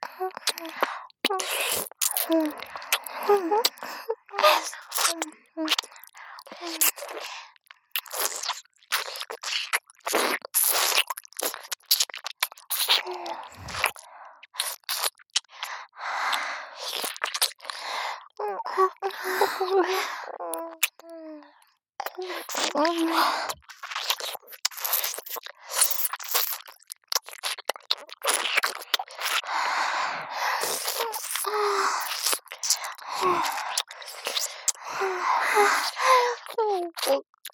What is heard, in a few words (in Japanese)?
あまとろ王女の両耳舐め, ループ; SE有り